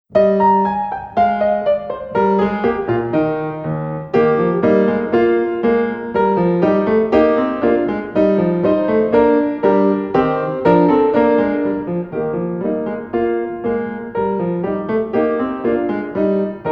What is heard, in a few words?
gavotte accomp